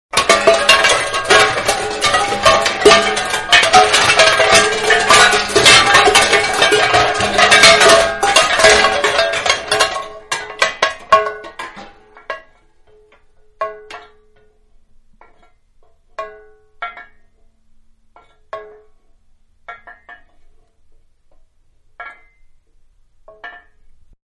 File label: Pots Banging Short; Pots-Hanging-Banging Together Short; Pots and Pans; Manhattan Production Music